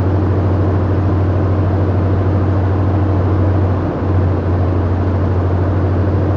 airplane medium idle; Hearts of Iron IV Death or Dishonor

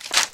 weapon impact soft, metal; physics; Garry's Mod; hl2

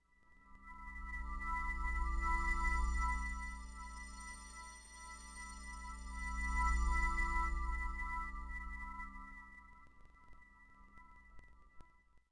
Icy Pad; Ambient; Sid Meier's Civilization 4 Complete; Beyond the Sword

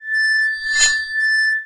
manhack blade loop, npc; Garry's Mod; hl2

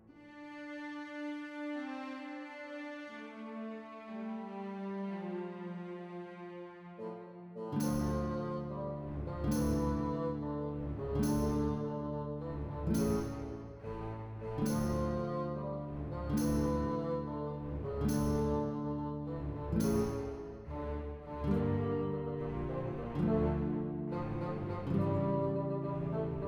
lords hall, music; Mount&Blade Warband; rhodok

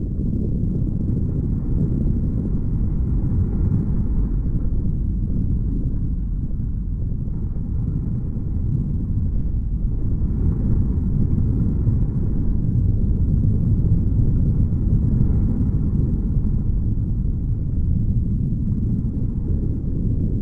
Sun Amb Bed Lp; Ambient; Sid Meier's Civilization 4 Complete; Beyond the Sword

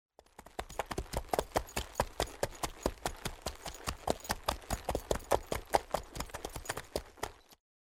Horse; Age of Empires III

